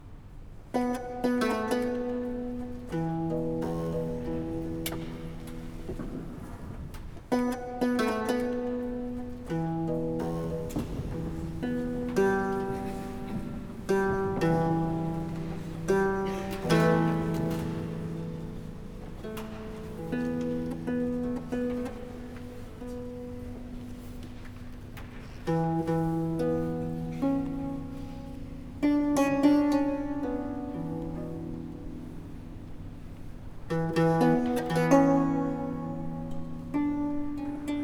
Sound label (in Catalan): Improvisació arpa